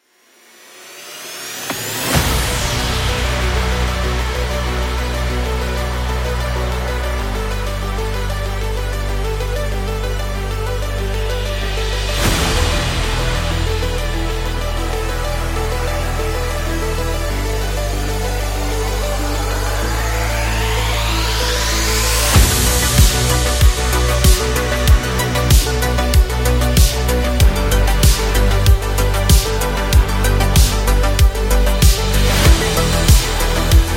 Tech Noir Retro

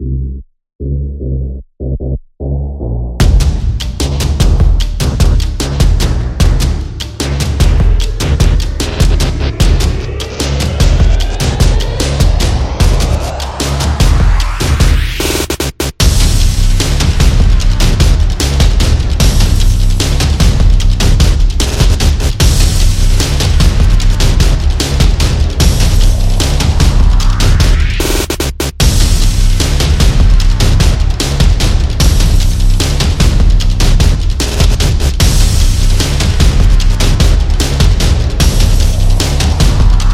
Epic Trap Intro

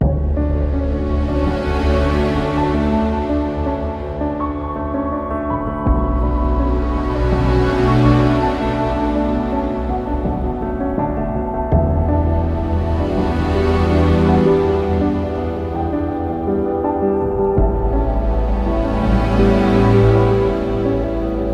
Relax Meditation; soundscape

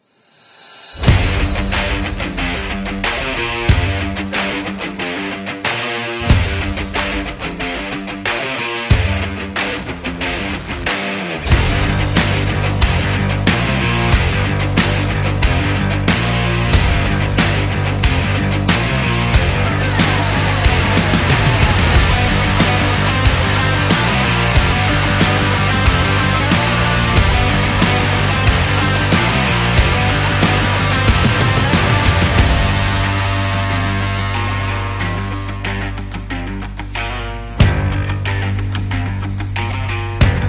Soundtrack Sport Rock; active rock music

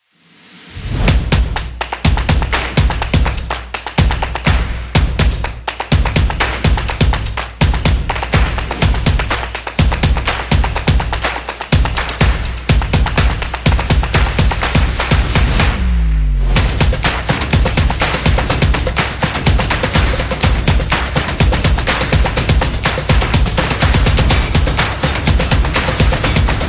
Action Sport Drums Beat; action drums percussion

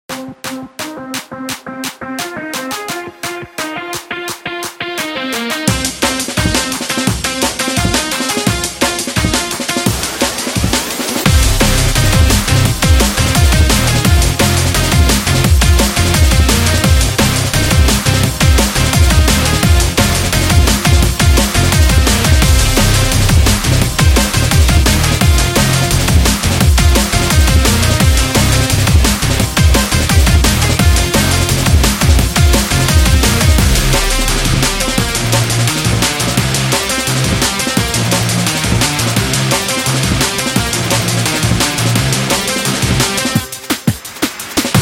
Rock Upbeat

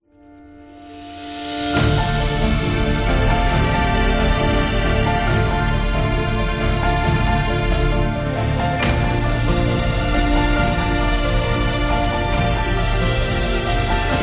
Sad Cinematic

